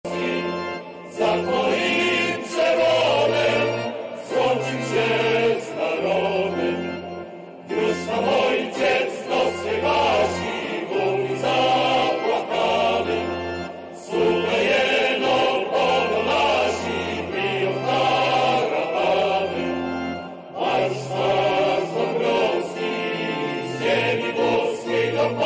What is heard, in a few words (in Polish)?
Hymn Chor